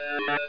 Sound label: alarm; XWINGTIE